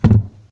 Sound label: bump; goodnight jellybean; godot